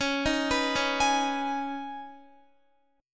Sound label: Db 7th Suspended 2nd Db, down, Guitar; Db7sus2; arpeggiated; tuning=4E,4A,5D,5G,5C,6F